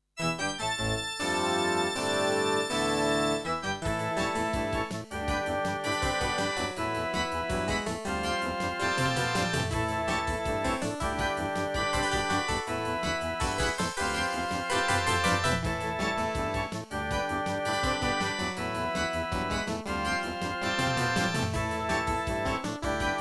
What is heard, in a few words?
Intro, MT-32